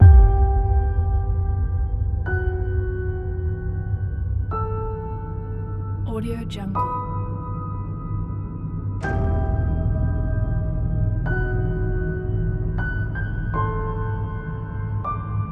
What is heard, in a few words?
thriller horror piano trailer; mrhumble